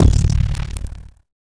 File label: Drums; Distored Reality